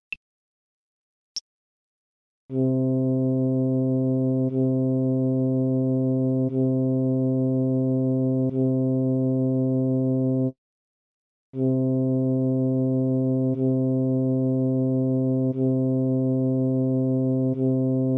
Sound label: c flute